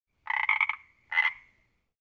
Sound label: Frog croaking